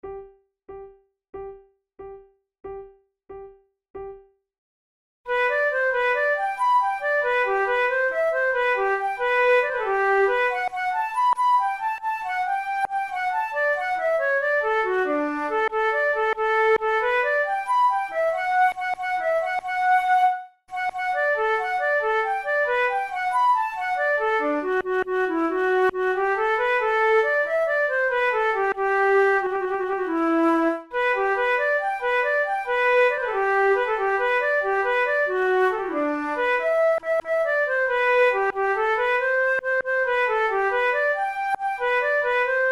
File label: duet; Neukomm; The Sea